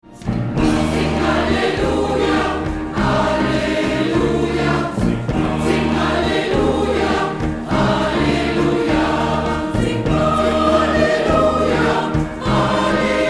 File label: Sing Alleluia